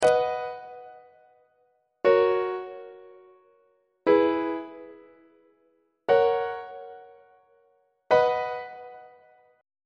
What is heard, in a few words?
b major inverted iac on treble clef